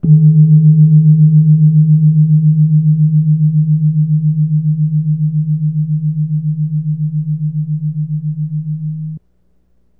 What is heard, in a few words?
jegogan, gamelan; gravityharps